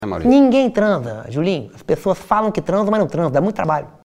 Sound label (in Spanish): choque